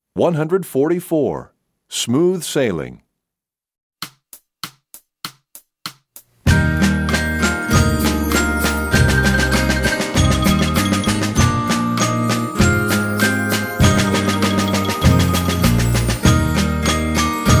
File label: Percussion; Smooth Sailing; EE2000 Book1